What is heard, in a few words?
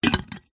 foley soda can tin stack empty stack on another